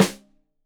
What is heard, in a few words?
Rim Shot Stereo, drums